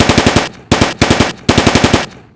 weapon

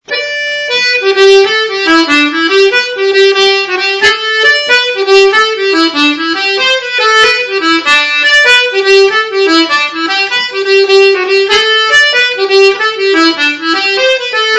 Swaggering Jig

